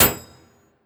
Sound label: Debris Death Hit